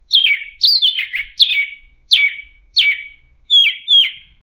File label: bird screaming tue tue tu